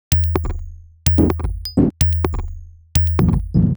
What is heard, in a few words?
Top C# 127bpm, House Drumloops; Zero-G House Fabrik Drumloops; ZG Drumloops